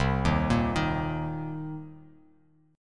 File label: C Minor 7th C